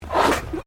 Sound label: Animal Alpaca Male Snort; Alpacas on a farm in Gippsland Victoria